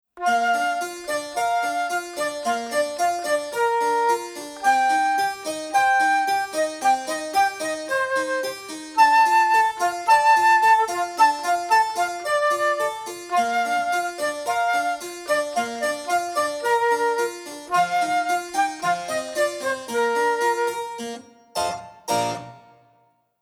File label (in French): PRELUDE POUR FLUTE